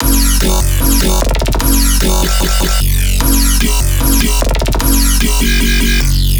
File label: Bass Loop F# Monstrous, Dubstep, 150bpm; Ghosthack; Dubstep Sound Arsenal